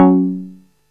QUINT F#3; Best Service Alpha Dance vol.2; QUINT SEQ

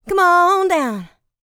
licks, vocal, dance; ILIO; Vocal Planet

